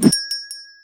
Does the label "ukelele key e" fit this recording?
No